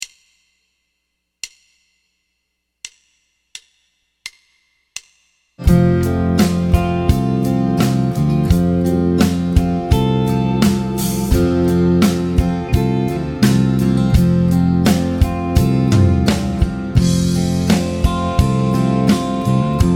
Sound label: Triad Vamp MAJ F; Triad Vamp F Major; Piano Practice Tracks